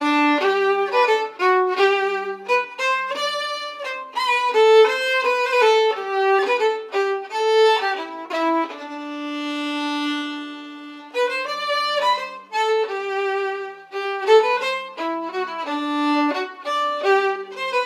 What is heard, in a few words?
Violin; Assassin's Creed Syndicate